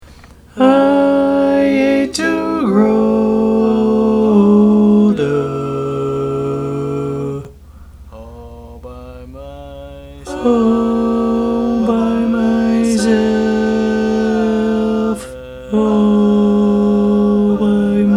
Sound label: Bari, barbershop